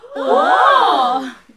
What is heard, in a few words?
NOISE, CLASSIC VOX; Best Service; Hallelujah